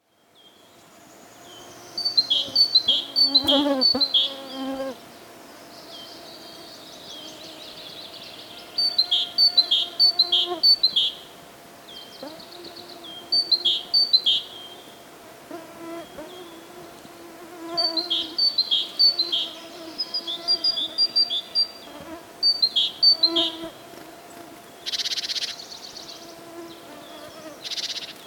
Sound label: Forest Birds And Mosquitoes